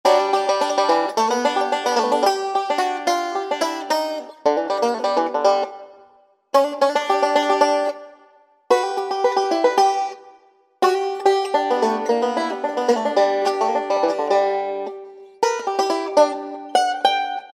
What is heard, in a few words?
BANJO LICKS, American Heartland; Sonic Reality American Heartland